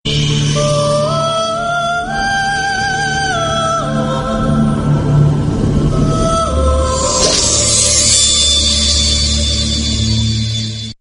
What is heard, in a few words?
sad ringtone; hatim